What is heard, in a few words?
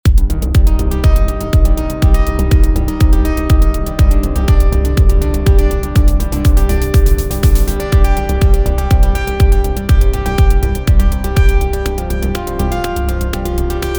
Melodic techno tools